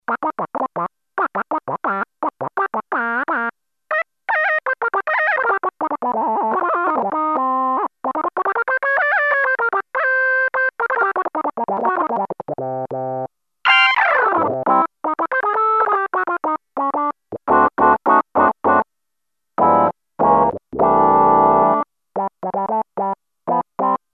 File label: Pluck, Roland Juno-106; Factory Preset; Donald Pluck